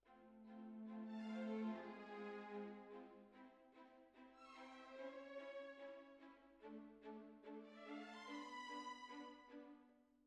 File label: Clarinet